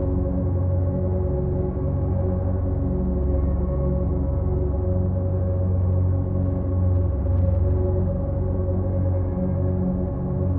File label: psi ship titan idle; ships; Stellaris; shroud